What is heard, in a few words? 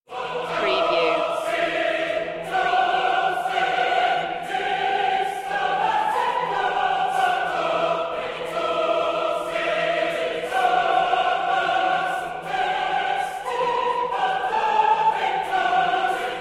choir only